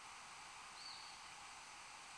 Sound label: 5kHz hump